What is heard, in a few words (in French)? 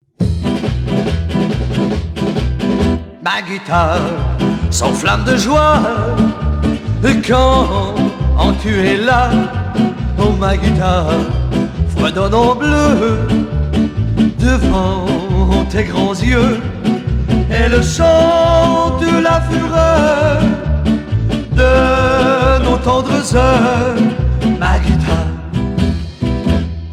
guitare